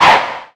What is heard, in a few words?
Spin